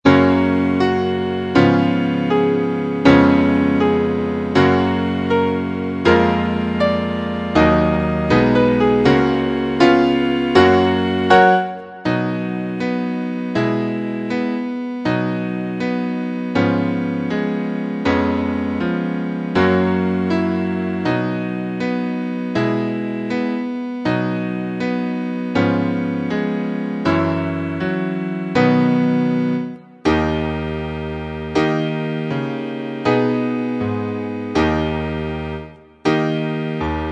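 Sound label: accompaniments, Trumpet in B; concone; Rudimentary Transposition Studies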